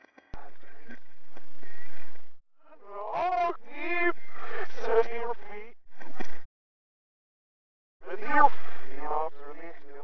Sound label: faint reversed low; century ghost; winchester